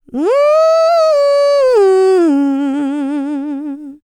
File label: LICKS, Vocal Planet, R&B; ILIO; Dance; LT R+B